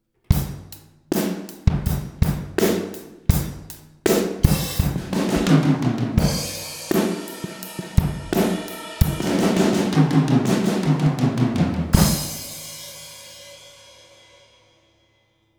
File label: 4 Piece 1 Mics, drum set